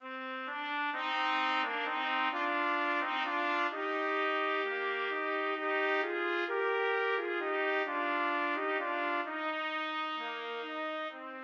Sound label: siciliana trumpet duet